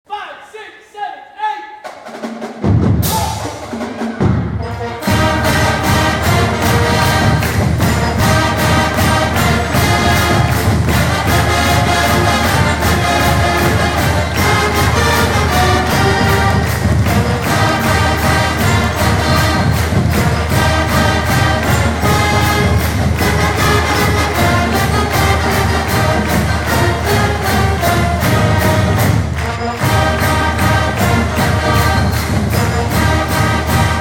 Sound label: fight song